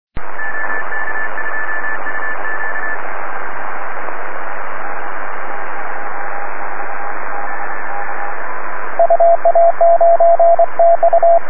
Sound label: morse